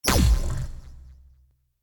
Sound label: defeat throw; smite; raijin